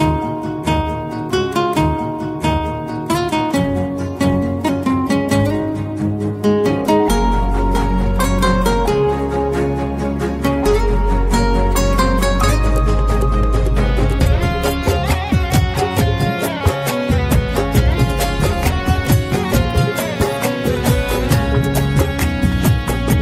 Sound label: Instrumental Tone; Ism; Telugu